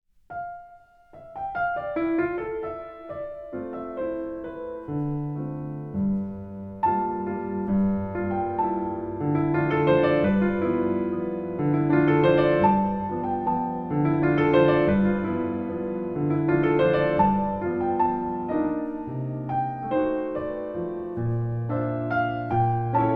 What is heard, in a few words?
Coucou Waltz